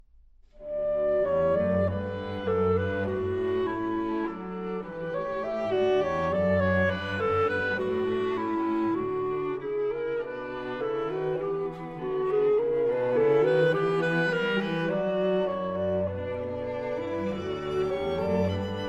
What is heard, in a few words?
Quintet in B Minor for Clarinet and Strings - Mvt. 3. Andantino; Brahms Trio & Quintet